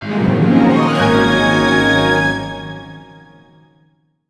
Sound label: Jingle Result Fanfale; A-Train City Simulator; A-Ressha de Ikou 3D; 3DS